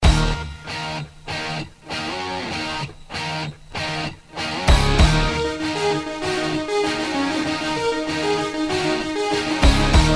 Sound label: Rock Guitar